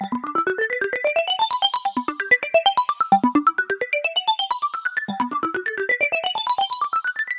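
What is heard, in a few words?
130 G major Seq TipToe; Zero-G; Future Wave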